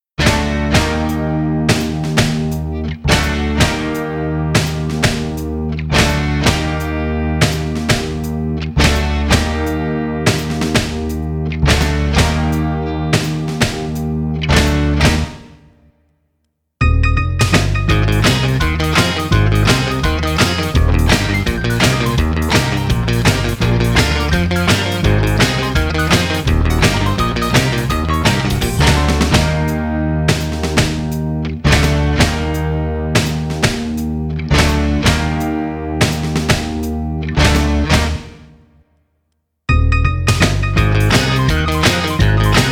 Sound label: kitchen house rock karaoke